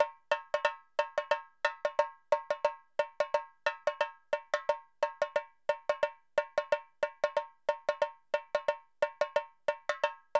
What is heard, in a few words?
New Gabra Dholki Loops